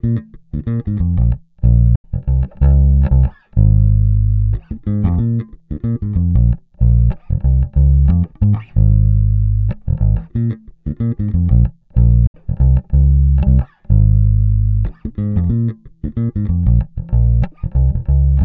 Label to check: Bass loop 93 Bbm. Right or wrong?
Right